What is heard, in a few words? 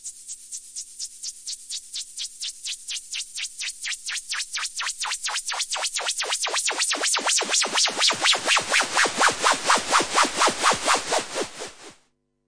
Yamaha CS5, Noise Sweeps